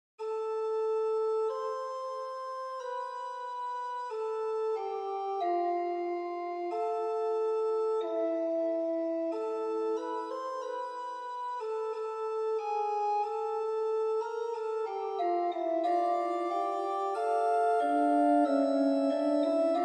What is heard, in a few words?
micro fugue, organ